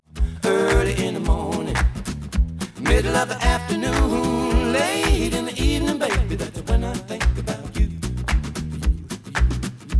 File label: noised; 3bit